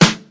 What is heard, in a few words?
dry acoustic snare g sharp